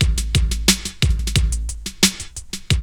LOOP; E-Lab; X Static Goldmine 2; akai

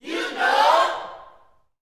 U KNOW, Gospel, SHOUTS; Spectrasonics; Vocal Planet Vol.1 Gospel; akai; UYC SHOUTS

